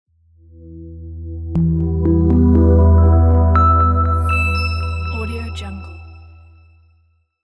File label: ambient logo reveal